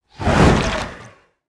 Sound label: cyclone; smite; poseidon